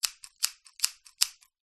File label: office scissors cut air x4